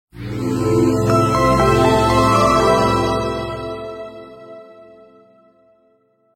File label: shutdown; Sanyo; M1; Startup and Shutdown